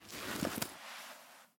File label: pickaxe deploy; weapons; rust